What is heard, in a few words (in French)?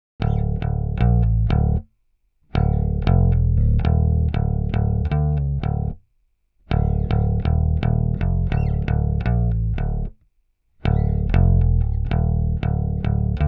basse